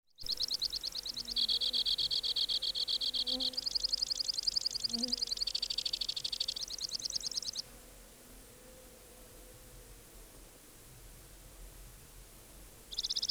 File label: Two barred Crossbill Loxia leucoptera